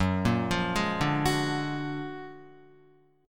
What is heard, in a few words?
Gb Augmented Major 9th Guitar; Gb+M9 arpeggiated; JGuitar